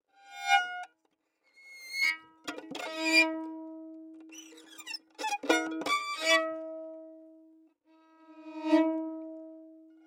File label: flautendo, Baschet; Groupe Geste; Geste Recherche; Extrait Conférence